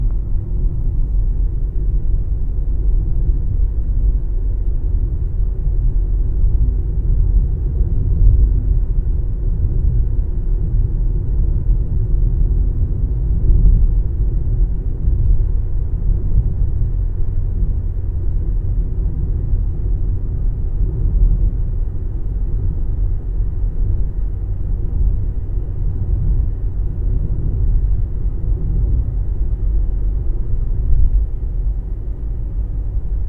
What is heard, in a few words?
driving over a freeway